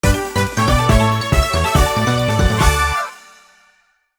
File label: Minigame Victory; Mario Party 4